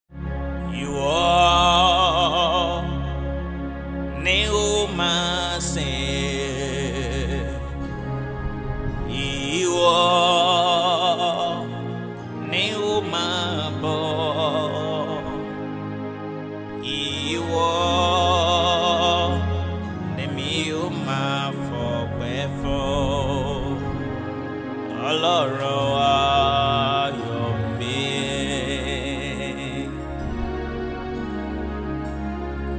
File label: Prayer Chant Worship Ambience